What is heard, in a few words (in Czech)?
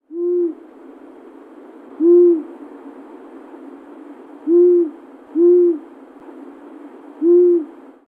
zvuky zvirat